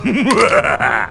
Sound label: laugh